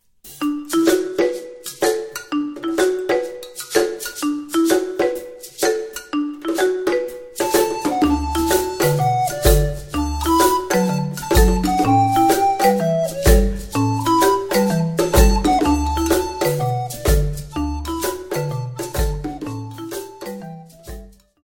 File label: Instrumental; He's Got the Whole World In His Hands